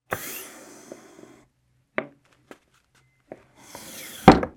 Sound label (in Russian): zvuk kresla